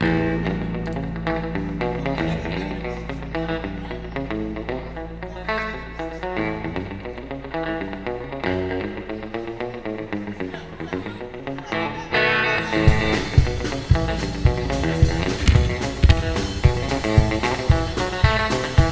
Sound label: Broken String Jam